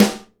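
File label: snare nylon damp, Live Snares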